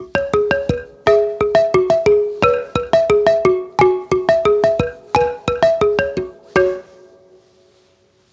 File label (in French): balafon